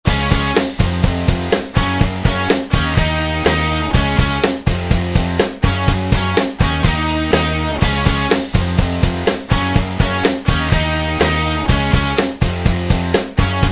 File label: bg loop; camp rock; rock n row